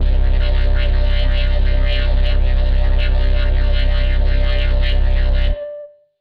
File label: Resonance, Basses; AMG - Prototype Drum & Bass